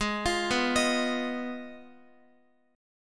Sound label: G sharp Minor 6th G sharp down Guitar; G#m6 arpeggiated; JGuitar